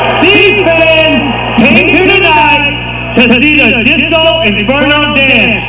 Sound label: disco